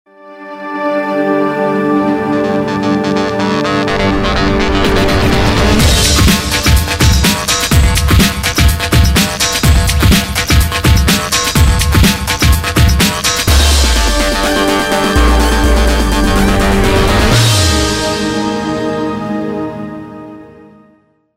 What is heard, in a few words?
music movies; CAC ASSETS